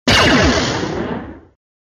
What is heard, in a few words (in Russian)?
piu blaster